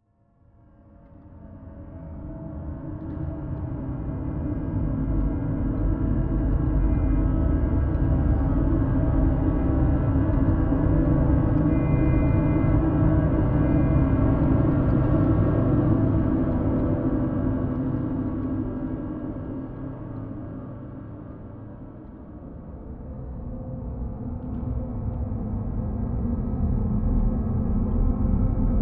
Creepy Ambient

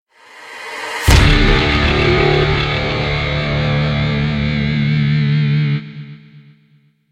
Lose; Fight of Steel Infinity Warrior; ps4 switch windows gamerip 2022

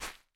Step Sand; miside demo

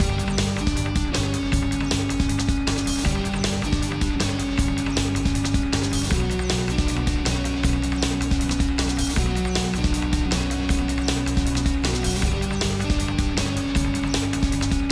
Tornado Combat MIXED; ben 10

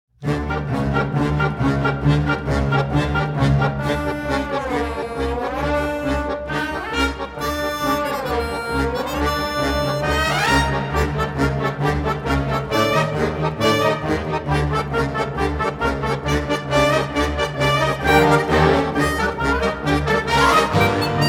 menu music

